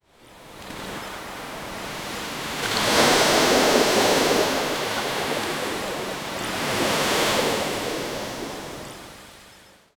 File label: ocean waves crashing on, beach